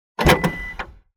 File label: door; deathmatch; n3xt inventario